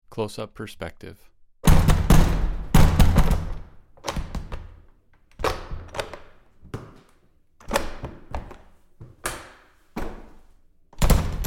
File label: Bathroom Stall Door